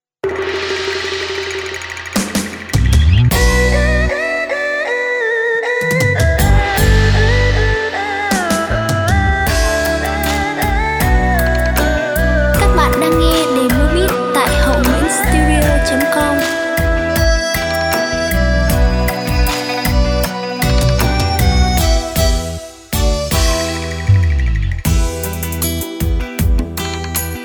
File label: beat, playback